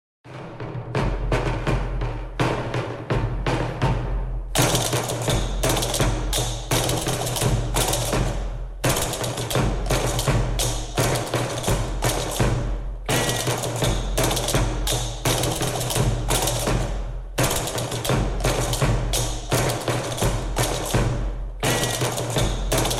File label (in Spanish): Percusion